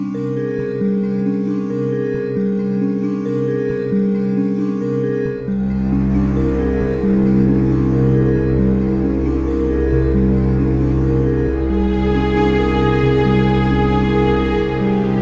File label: Ominous